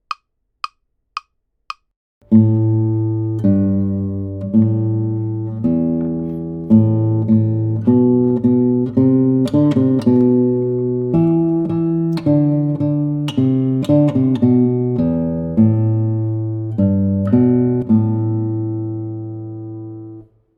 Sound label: melody; guitar bass6; guitar basics